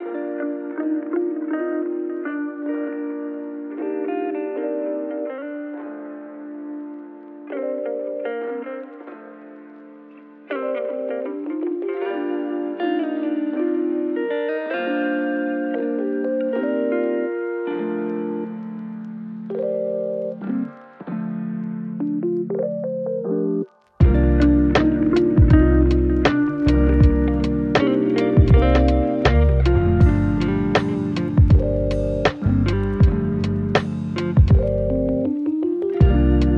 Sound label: lofi hip hop